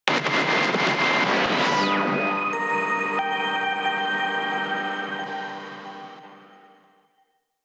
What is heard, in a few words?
Mnemonic Static C; DE; Warframe; Night Wave